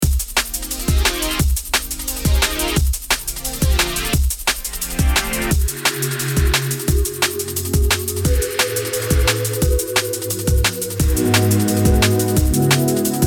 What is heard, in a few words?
Pads Example; Kumo